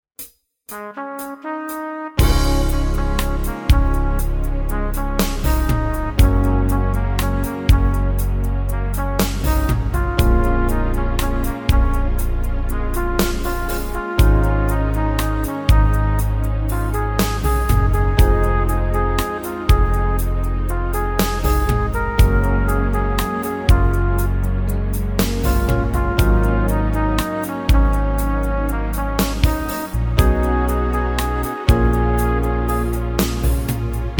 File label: Korg PA800